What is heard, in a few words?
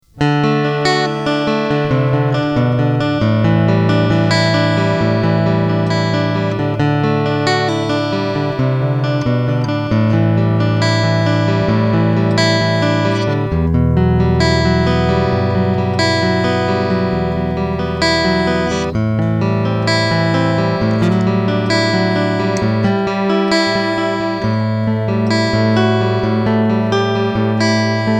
Ibanez TCM50 EQ FULL Picking